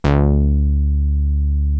ARP BASS

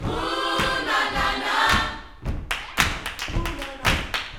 YOUTH CH PHRASE, Vocal Planet Gospel

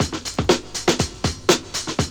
D3 BREAK

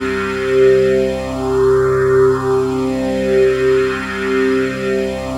PHASE PAD, ATMO PADS; Best Service; XX Large

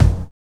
BD; E-Lab; X Static Goldmine 1

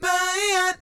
BAYEEAT, Vocal Planet Jazz Fx; Spectrasonics

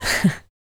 LAUGHS, Jazz Fx, Vocal

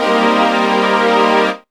STR PAD

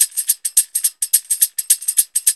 LOOP; E-Lab; X Static Goldmine 2; akai

